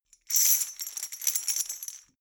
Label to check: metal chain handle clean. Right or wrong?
Right